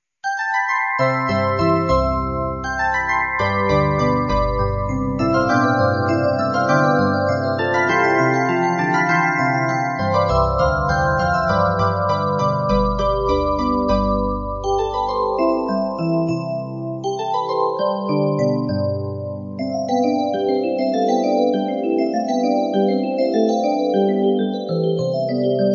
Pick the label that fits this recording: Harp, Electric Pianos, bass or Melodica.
Harp